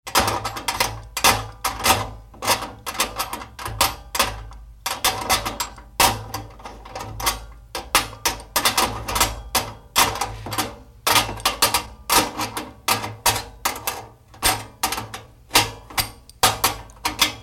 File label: industrial tools wrench assembling bicycle; sound effects one